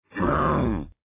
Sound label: zombie Damage; TDGame